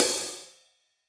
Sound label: HIHAT tip loose